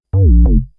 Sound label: coffee out; coffee crisis